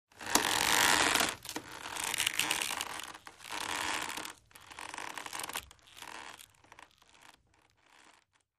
Rope: Hemp Rope Creaks Alongside Boat. Deep Creaks